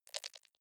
click erratic wide

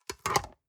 storage lid slide close medium, box; objekte

